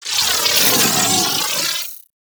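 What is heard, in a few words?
Missile Swarm Projectile By; Warframe; Corpus; Corpus Railjack; Spec Ops Arachnoid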